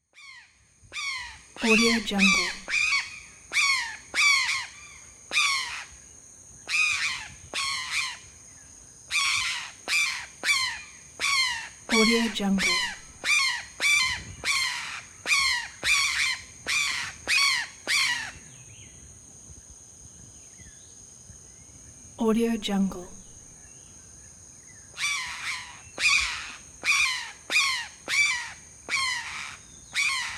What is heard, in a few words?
birds in jungle; sunnyplanet